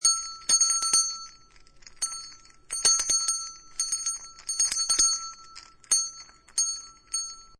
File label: Bell Small, A small bell chimes; Bells (Sound Effects:Musical)